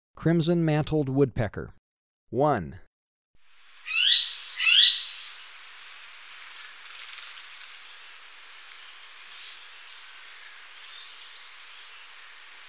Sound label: Crimson-mantled Woodpecker; S. America